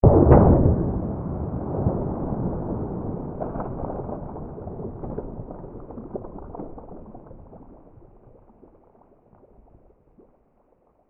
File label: Underwater Splash, Water, Underwater, Splash, Impact, Large; BlastWave